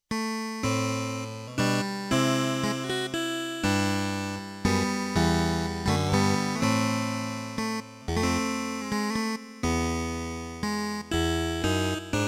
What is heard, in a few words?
Casio Guitar